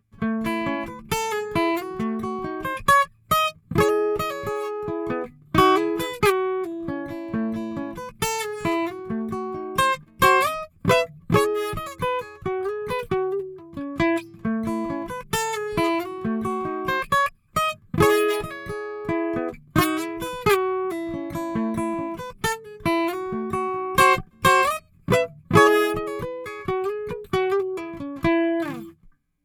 Taylor 314ce Neumann U87, guitars

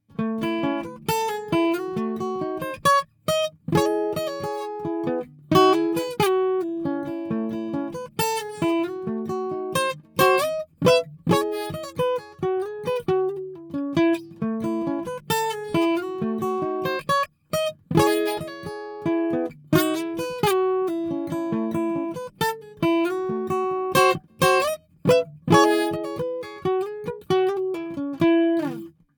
Hummingbird DPA 4011, guitars

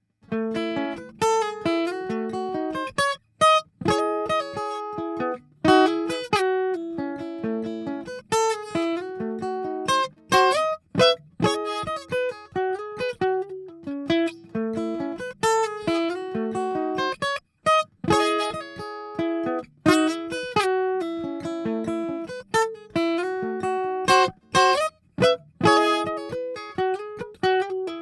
J45 DPA 4011, guitars

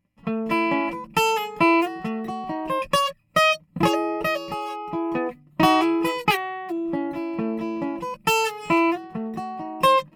Collings D2H Neumann KM84, guitars

